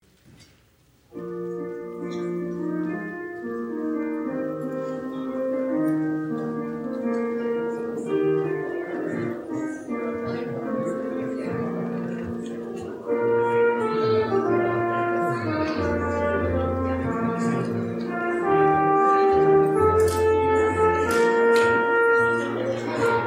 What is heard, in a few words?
trumpet